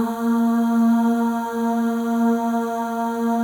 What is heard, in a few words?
M+F AHS; Spectrasonics; Symphony of Voices